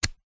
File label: usb a cable plug remove, technology